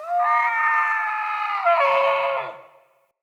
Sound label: stag stampede vocal; Powers; Stag Pact